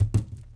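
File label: bodyfall metal; foley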